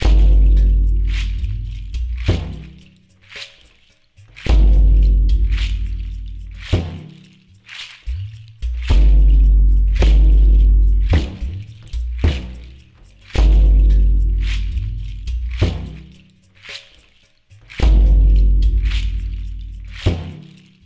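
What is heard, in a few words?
Ambience Percussion Loop; underworld ascendant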